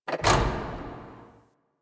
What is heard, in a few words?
Worker Lever Pull; ambience; props; one offs; Warframe; Venus Open World; Venus Solaris United